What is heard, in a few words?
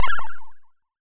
Hit Hurt